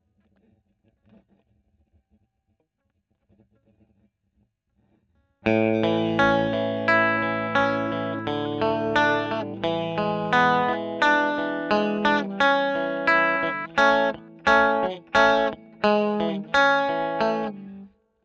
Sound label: E. GTR Royer